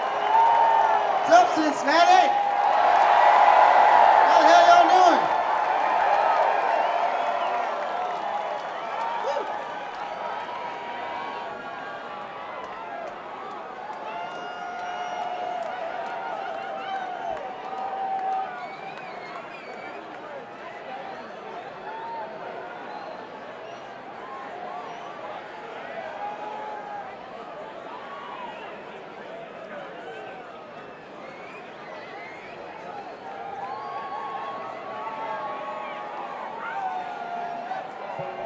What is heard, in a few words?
Greeting Crowd; Bluegrass; mg21